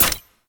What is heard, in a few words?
Jet Turbine Pistol Mech